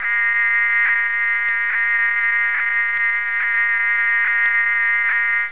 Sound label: warning alarm